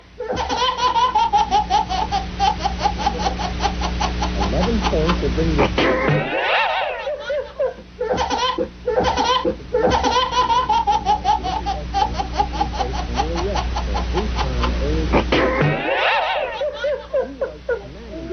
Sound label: Funny Babies